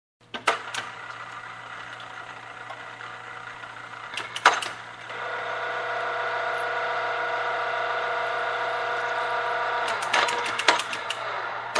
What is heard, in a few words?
Machinery Cutter